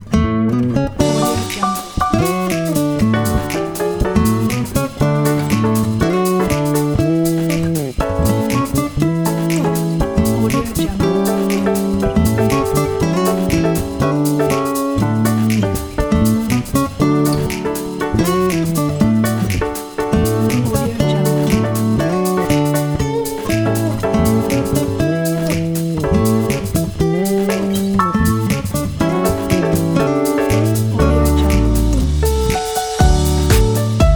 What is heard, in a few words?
happy swing